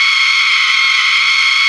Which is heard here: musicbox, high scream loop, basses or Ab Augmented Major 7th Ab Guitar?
high scream loop